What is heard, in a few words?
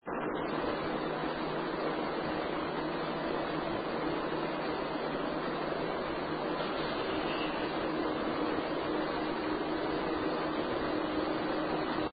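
museum noise no idea; muesum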